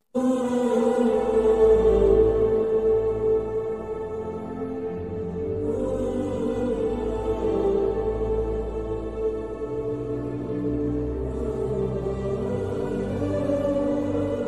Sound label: sad bgm ringtone; kaatera movie